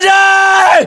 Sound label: melee charge